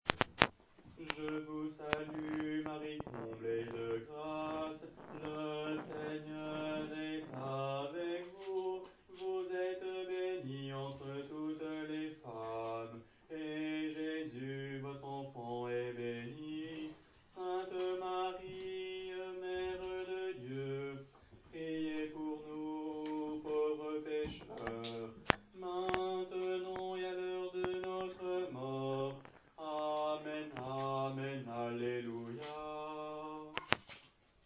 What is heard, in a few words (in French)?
Voix de basse; je vous salue Marie